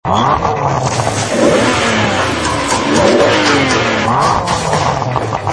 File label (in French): Ferrari 612 Scaglietti point mort